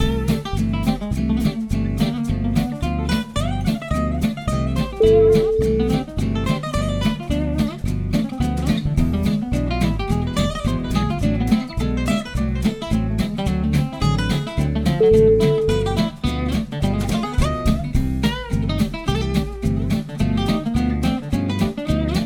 food show jazz

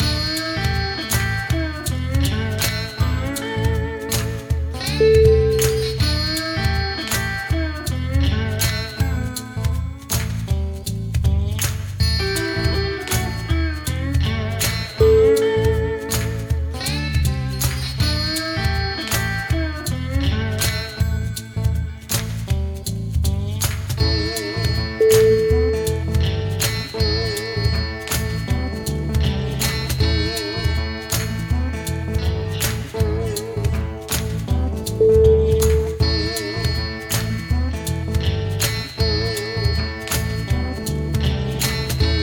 southern road trip

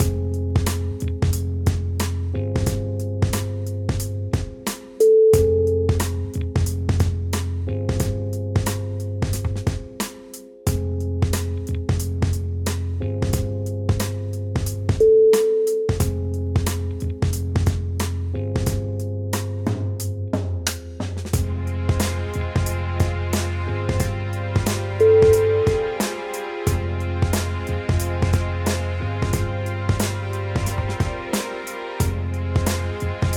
dramatic lounge

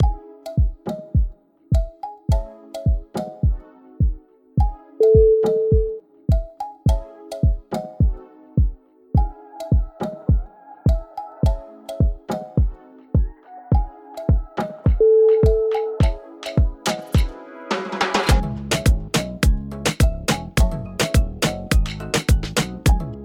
latin vibes dancehall

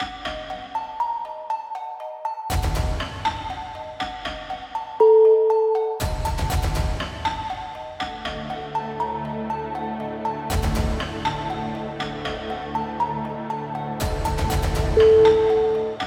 chimes of fear

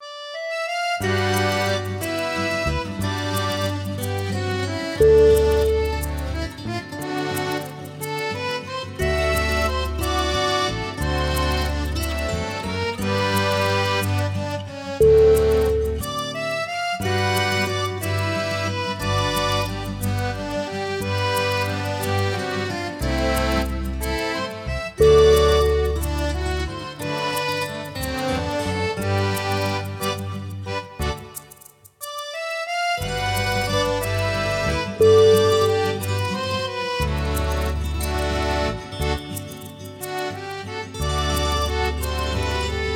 paris accordion

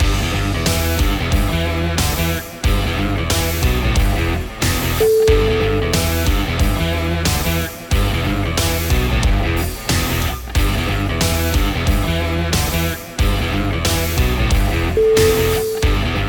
hard powerful scratch

